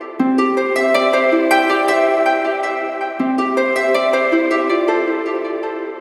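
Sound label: Dreamful, strings; струнные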